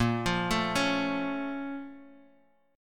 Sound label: A sharp Minor Major 7th, down, Guitar, DADGAD